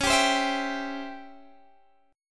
C sharp Minor Major 7th Flat 5th C sharp Guitar Open D, chord